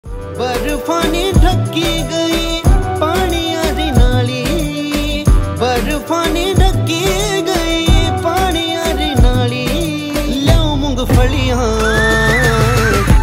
uttrakhandi ringtone

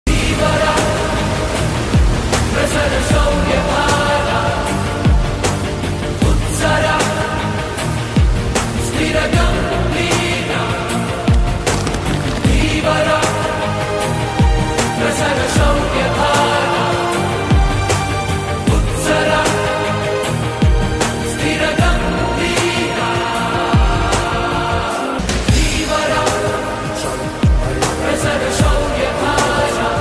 BGM ringtone, tamil ringtone

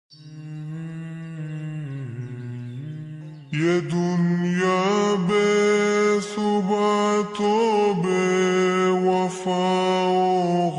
Islamic lofi version ringtone

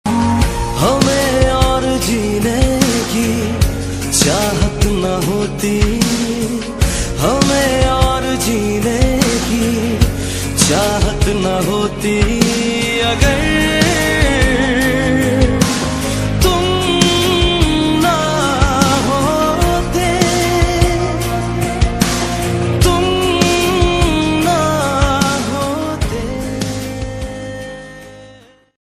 OST ringtone; Tum Mere Kya Ho

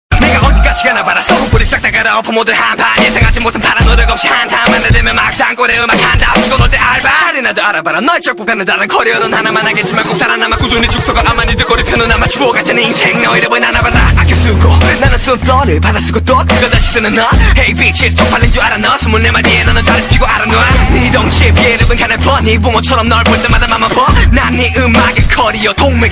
korean ringtone

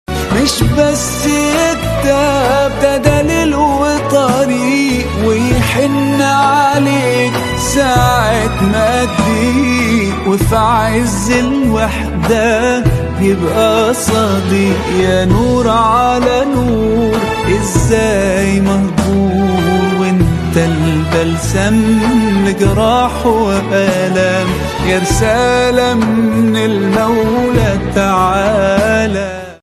arabic ringtone

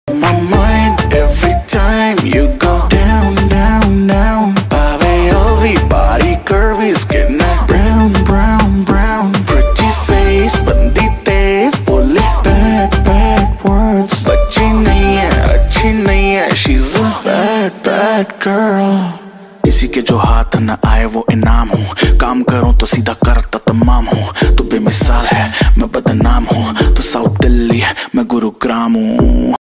hindi rap ringtone

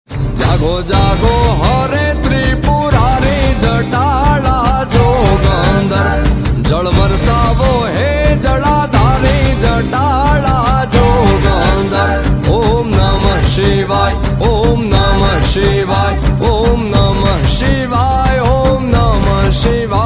gujarati ringtone

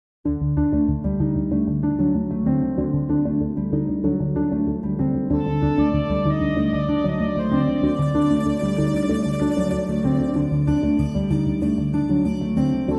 Devotional BGM ringtone

